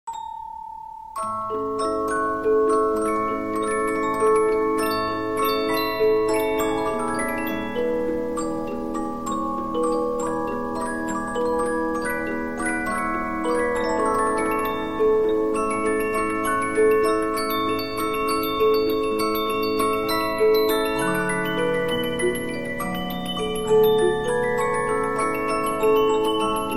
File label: Polyphon, 15inch disc musicbox